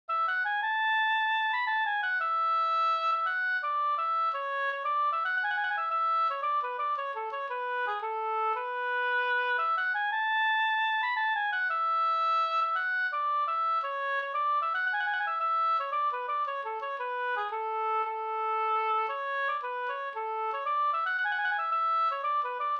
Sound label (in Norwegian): pols; fr Oppdal el Folldal no V1